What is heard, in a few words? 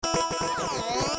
alien fon